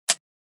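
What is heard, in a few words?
flashlight on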